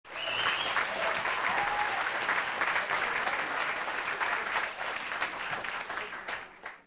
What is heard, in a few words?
applause